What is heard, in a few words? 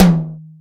CONG TOM, CONGA BONGO; Techno 138 BPM; Best Service ProSamples vol.54; AKAI